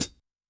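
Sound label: Heavy Pedal, HiHat, Yamaha DTXpress-II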